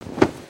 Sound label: cloth; creatures organic